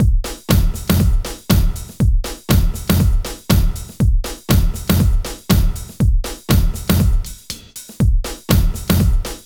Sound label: house; Funky Xtreams; INIS